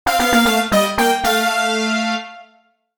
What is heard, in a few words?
level up